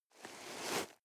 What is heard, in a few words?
nylon leather soft